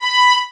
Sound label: violin